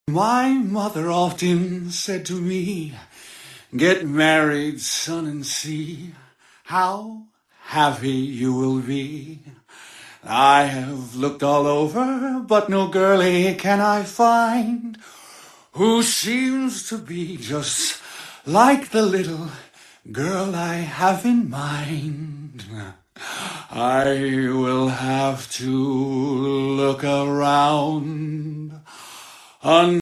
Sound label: The Groom singing